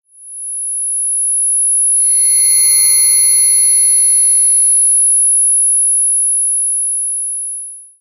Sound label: tinnitus